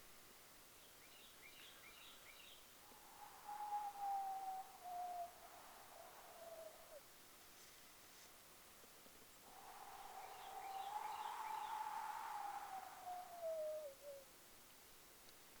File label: Wind Whsitle